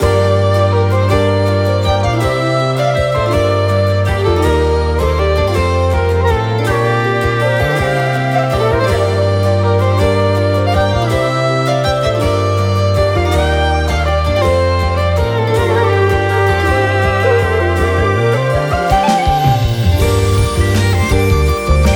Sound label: Deep Woods loop